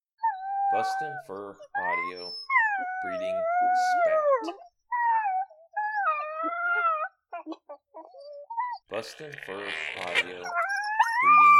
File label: Breeding Spat